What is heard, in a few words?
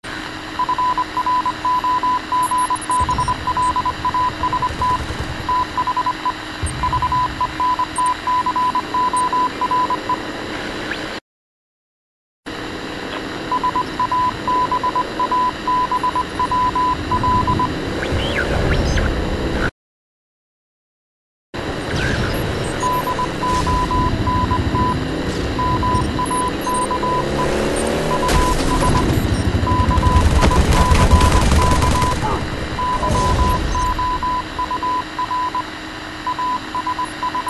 morse code; h3b morse egg